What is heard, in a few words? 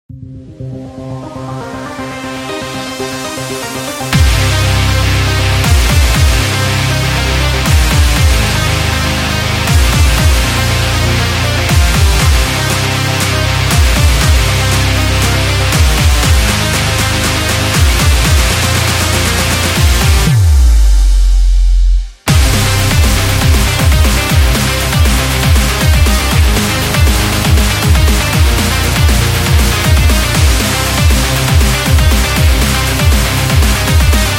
Powerful Sport Rock Extreme